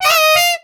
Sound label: male hit